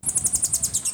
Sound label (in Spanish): canto zumby, Zumbador Verde de Puerto Rico